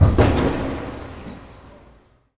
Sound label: Distant Shot; SFX; IRL Recorded